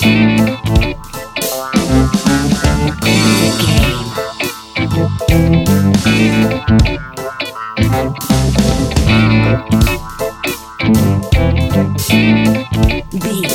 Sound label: reggae, island reggae Music; A hot sunshing BBQ with the backdrop of island reggae Music!